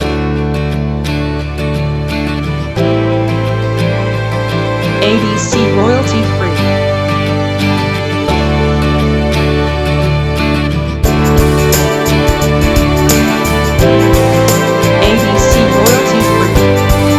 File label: Downtempo Chillout Strings